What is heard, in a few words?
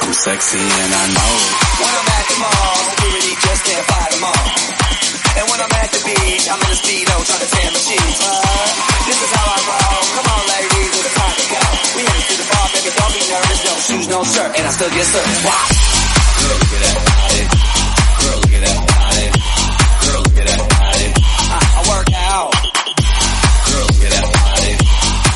132bpm G Tech House; remixplanet